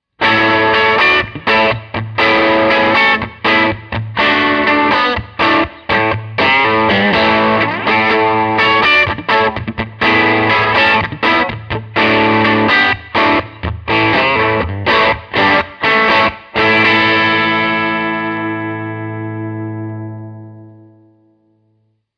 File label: Crunch; One Control